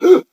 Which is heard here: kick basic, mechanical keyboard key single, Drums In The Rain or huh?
huh